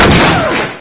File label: blast; sven; npcs; robo snark